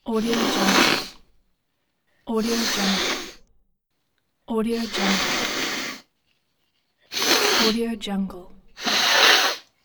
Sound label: blowing nose